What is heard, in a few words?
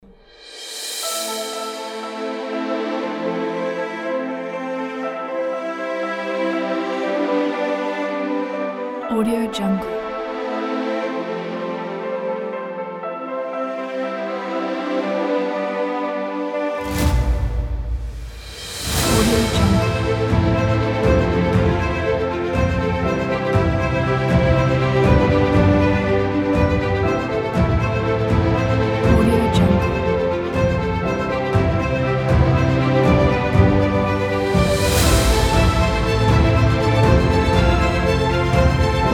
orchestra epic cinematic